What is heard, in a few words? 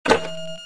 button; Star Wars Jedi Knight II Jedi Outcast; XBOX; switches